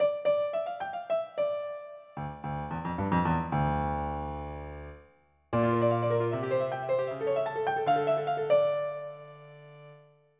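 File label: pipe organ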